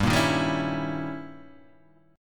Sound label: Eb 7th Flat 9th, G